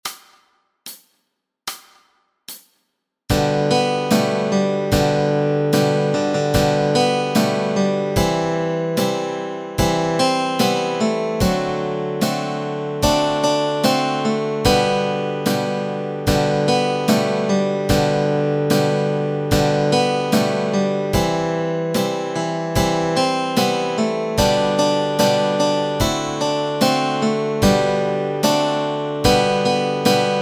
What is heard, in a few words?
melody and chords; Jingle Bells